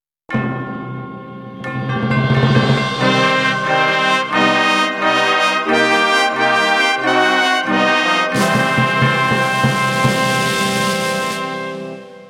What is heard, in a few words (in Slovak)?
fanfara; znelka